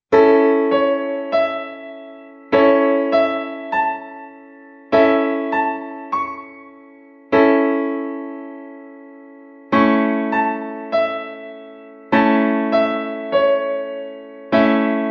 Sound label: A Piano Keyboard Chord